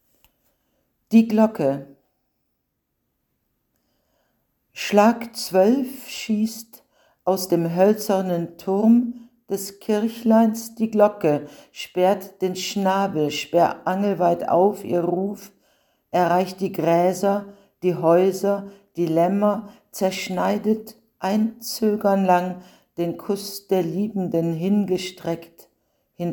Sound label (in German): glocke